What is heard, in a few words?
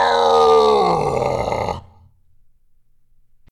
Pain Scream Serious